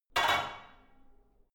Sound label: barbell only replace hard